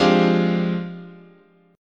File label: F-Minor 6th Add 9th, chord, guitar